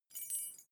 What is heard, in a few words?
key ring mid size fly tinkle; objekte; schluessel